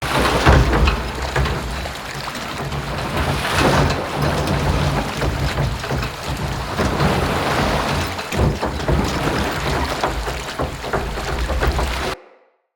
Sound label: Under City Mill Loop